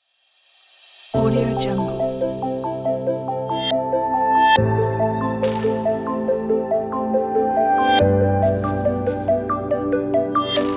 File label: laboratory research logo; teasound